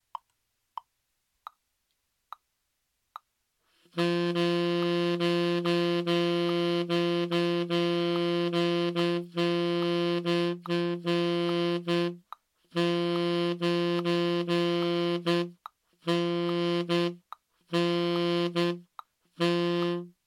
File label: legato, saxophone